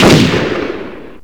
dragon fire

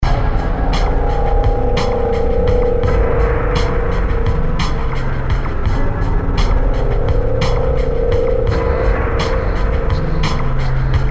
Pulse Phase

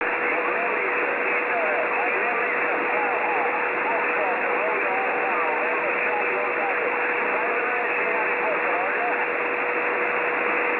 comm amb, ambience

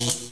spark

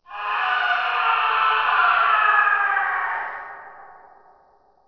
scream

